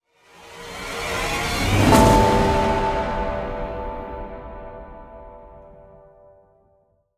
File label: stinger hero appear; roguebook